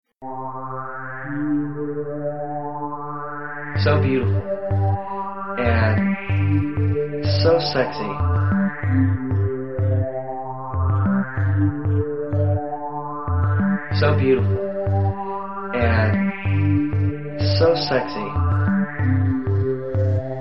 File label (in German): glocken, Erotik; Weihnachtsanthologie von Elysion-Books "Süßer die Glocken...